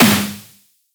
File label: Snare, Data Mosh Hits; Snares; 99Sounds; Mangling Audio